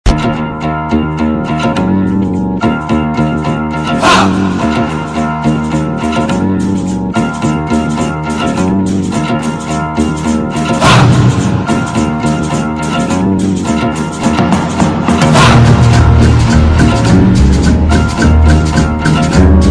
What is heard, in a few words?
theme, bgm ringtone, malayalam bgm; malaikottai vaaliban